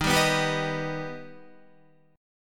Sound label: Eb Augmented Eb, Guitar; strummed; chordshape; JGuitar